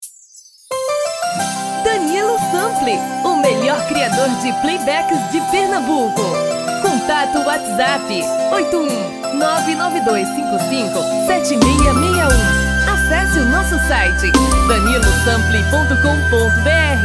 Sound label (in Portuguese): forro das antigas